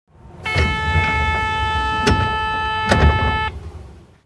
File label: door open